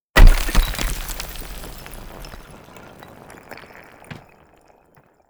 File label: Ice, Impact, Large, Slide, Glacier Ice; Mattia Cellotto